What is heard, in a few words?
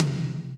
TOM TOM, Roland